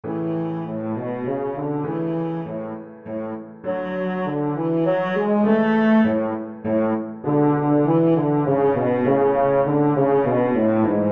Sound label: bach minuet, cello